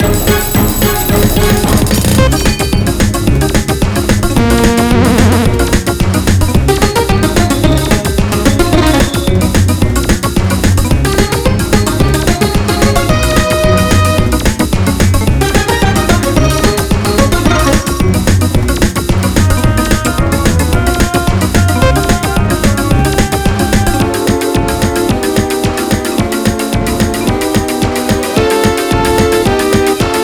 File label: Tick Tock Clock; DS Tick Tock Clock (Final Lap, Frontrunning)